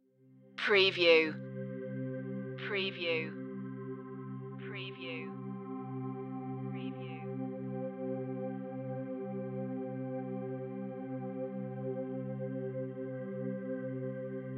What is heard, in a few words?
echoes no piano